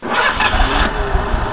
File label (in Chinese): qidong; svencoop